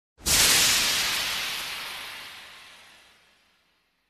sbros davleniia vozduh

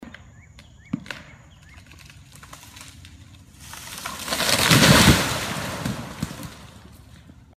v lesu upalo staroe derevo

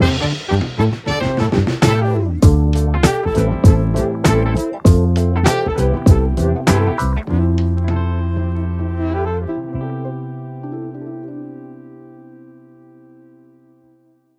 muzykalnyaya otbivka

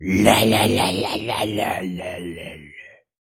psih, zvuki sumasshedshego

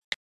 najatie na klaviaturu iphone

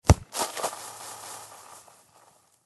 miach trava katit

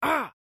zvuk boli

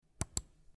zvuk komputernoy myshki

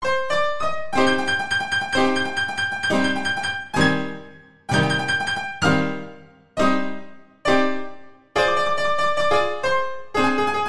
muzyka nemogo kino; dlya montaja

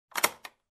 zvuk dvernogo zamka

